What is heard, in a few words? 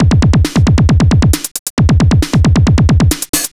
techno